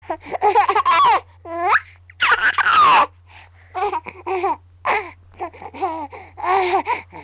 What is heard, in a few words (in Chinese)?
婴儿笑声-小孩